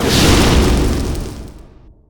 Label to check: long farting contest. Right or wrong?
Wrong